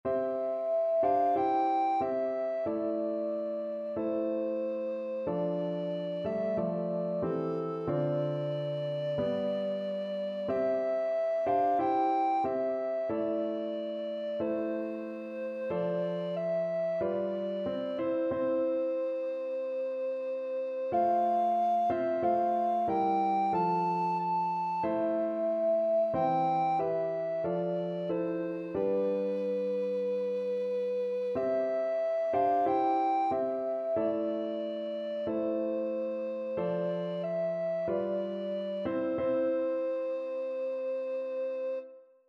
bleak midwinter, alto recorder

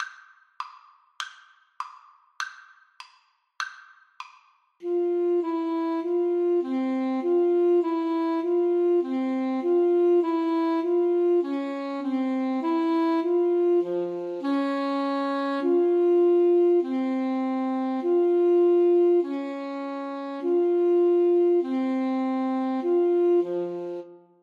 Alto Saxophone Duet

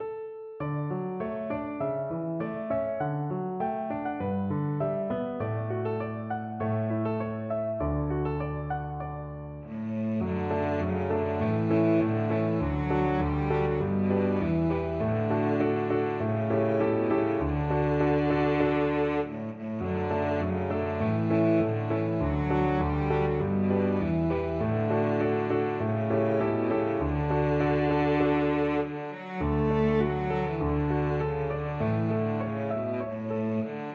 cello

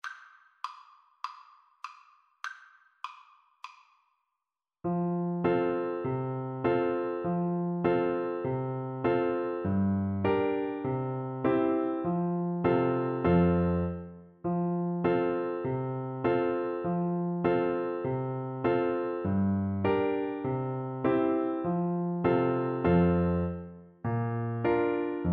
de laredo, French Horn

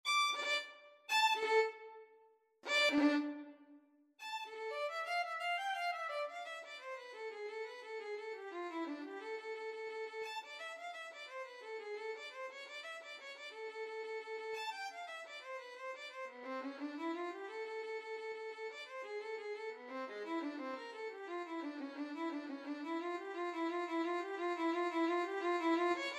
violin; beet sym9 2nd mvt

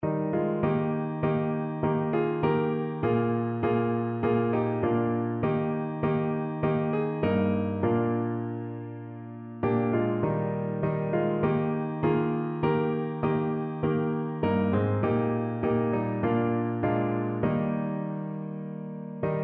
on jordan, piano

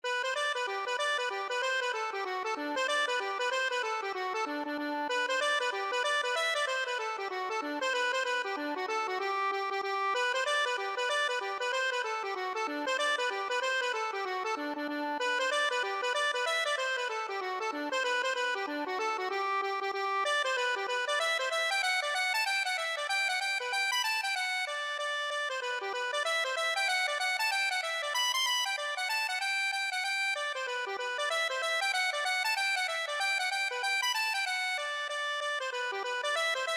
accordion

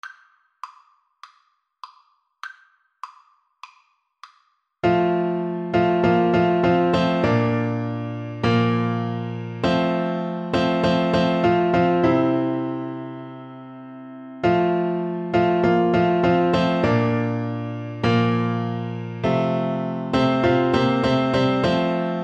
French Horn; Converse, Charles C - What a Friend We Have in Jesus

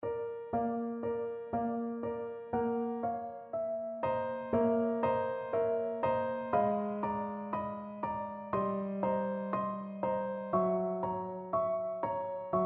trumpet

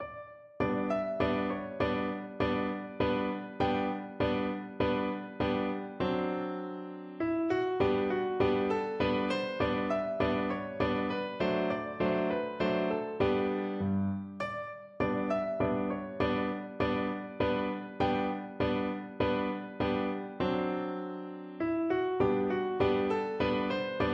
german dance, piano

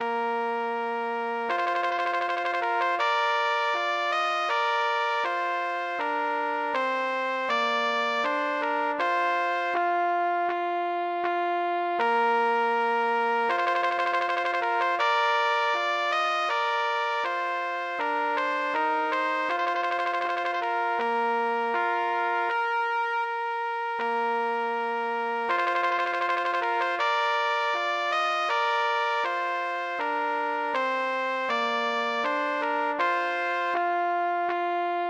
trumpet voluntary, trumpet duet

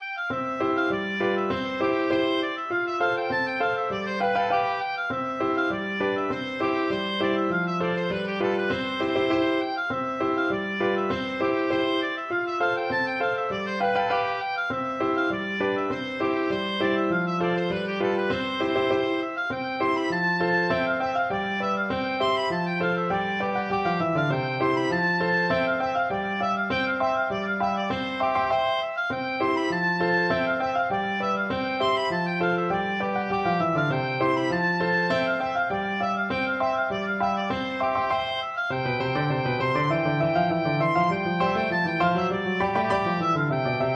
Galope, oboe

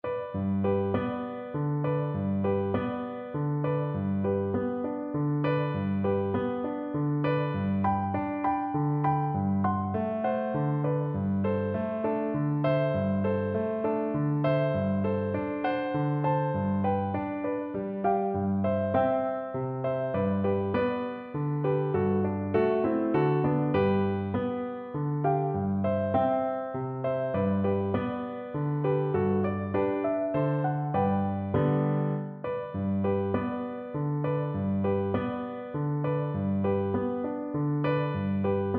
lullaby, flute

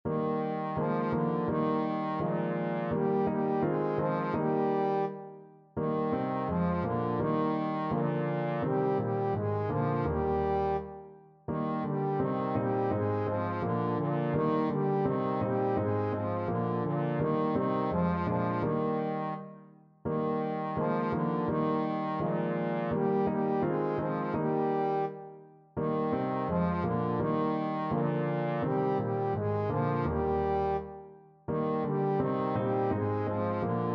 amid the silence, trombone

